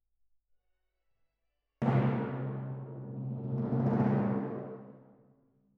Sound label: Timp